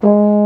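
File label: baritone G#2 horn; InVision Interactive; Lightware VOL-1; Instruments & Percussions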